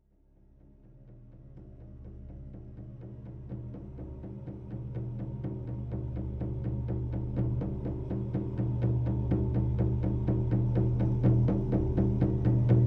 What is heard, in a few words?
Drumming; Schamanische Mantren, empfangen von Kailash zu besonderen Zeiten an verschiedenen Kraftplä